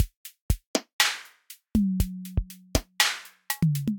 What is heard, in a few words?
White Kit, Synthesized; Drums; Ableton; Factory Packs; Drum Essentials